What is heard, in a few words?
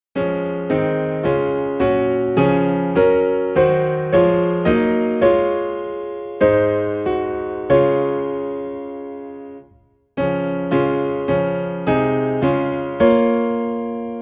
Piano in D Flat; Rejoice the Lord is King